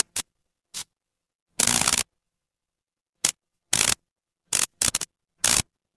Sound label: fire electric arc